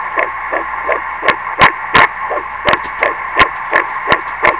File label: baby heart